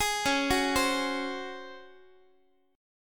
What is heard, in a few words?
C sharp Major 7th Suspended 4th C sharp Guitar; C#M7sus4; arpeggiated